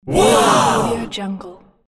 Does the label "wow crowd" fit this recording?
Yes